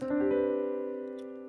c7sus2

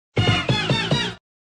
graffiti finish sound effect; jsr

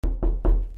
Knock on Door

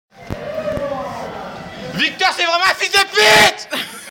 vocal

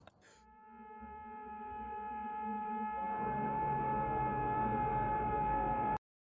screaming

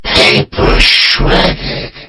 PAPER SHREDDED

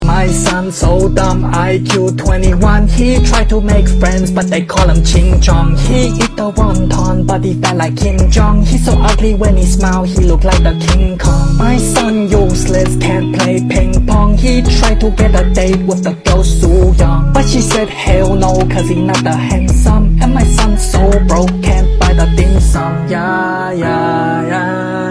rap; Asian

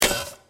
locust walking, footstep; the locust; gmod

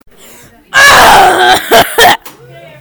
groan

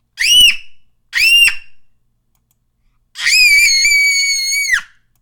scream

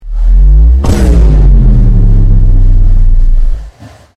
bass drop vine boom; Voicemod

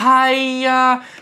hiyaaa

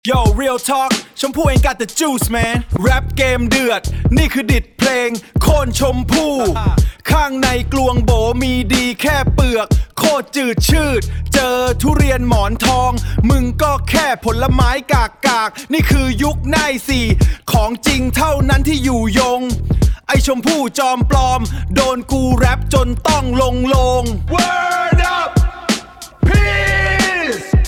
rap

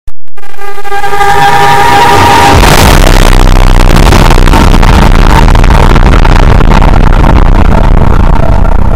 zombies round start sound earrape; cod

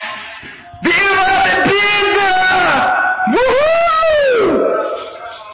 epic scream

